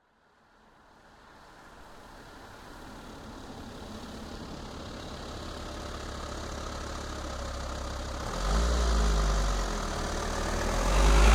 Volkswagen Caravelle T5 2.0 TDI EXT - approach stop drive away gentle front; stereo AB; Soundholder